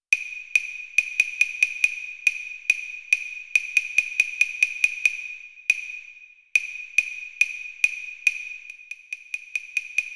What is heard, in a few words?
woodpecker claves; datel